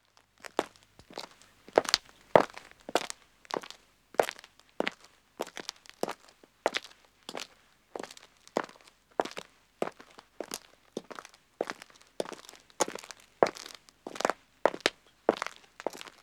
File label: Country Road Walking 2 men, Footsteps; BBC Sound Effects Library